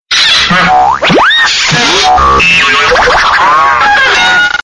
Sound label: Cartoon 3d